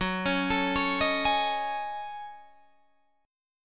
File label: F sharp Minor 6th Add 9th, Guitar, Drop D